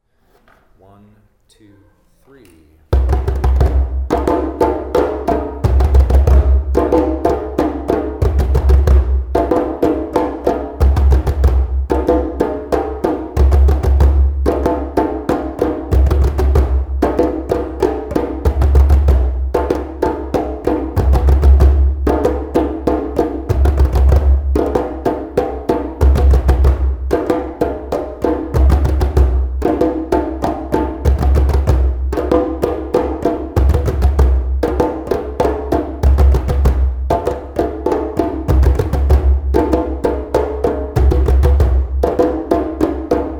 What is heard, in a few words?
merengue, drumming